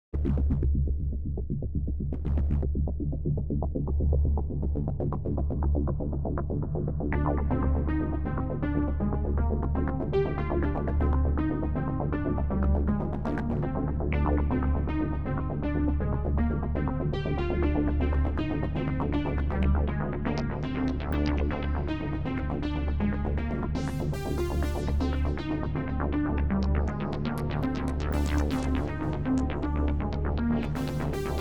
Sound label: Synths